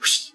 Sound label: sandfish swim